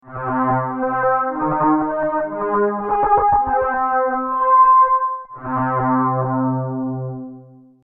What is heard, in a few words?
Memory grrr, samplemoog